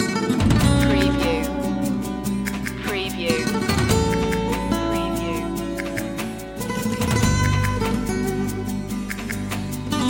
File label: flamenco etchings loop